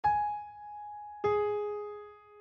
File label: down oct